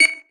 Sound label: weap semtex beep; gmod; viper